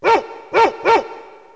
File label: dog